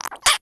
cartoon leather pants squeak

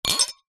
wine glasses x3 pick up one handed clink; household

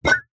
foley bottle glass pick up

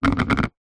household plate plastic childs down on surface

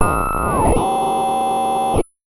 Radio Relay; martyn stonehouse

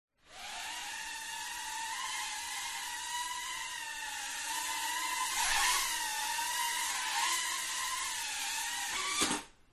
Drone; fox audio

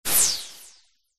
magic wand whoosh burst; zapsplat

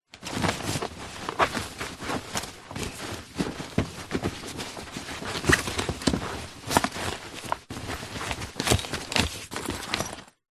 rummage frantic suitcase; foley

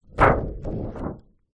paper laminated large sheet drop; foley

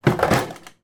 household freezer compartment drawer open

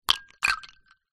drink ice water glass movement

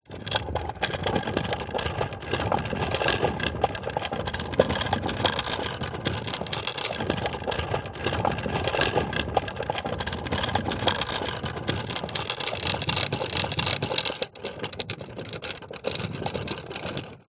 wooden trolley push roll wood floor; foley

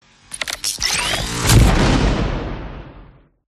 WEAPONS SHOT

